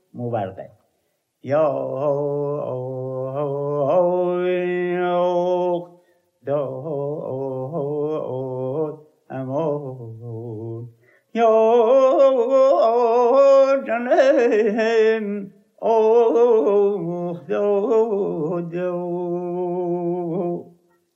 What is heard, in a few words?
mobargha, rast panjghah